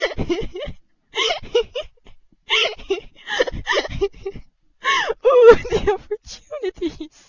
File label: laugh attack